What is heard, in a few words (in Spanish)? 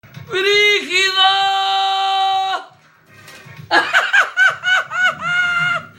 jajaja